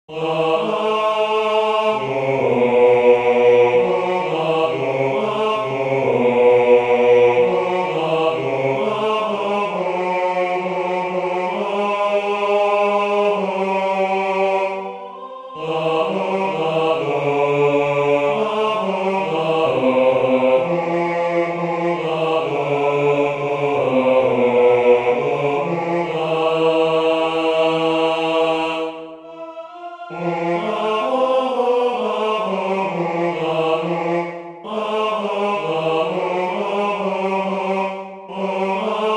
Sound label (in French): choeur basses; WSS